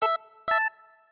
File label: notify